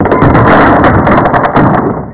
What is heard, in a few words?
falling lava balls; bump n burn